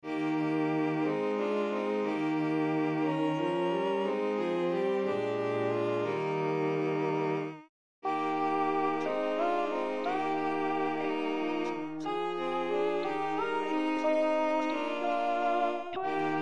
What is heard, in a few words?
Sop o salutaris; Messe breve; o salutaris cordes